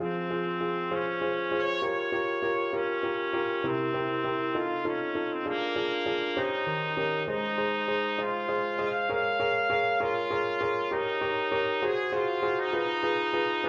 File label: prelude, trumpet; pachulski prelude